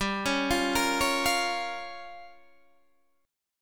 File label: G Minor 7th Flat 5th, Guitar DADGAD